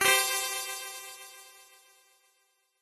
retro game bit coin arcade 80s simple alert notification coin touch classic